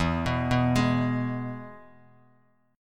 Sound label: E-Major E, Bass Standard; arpeggiated; JGuitar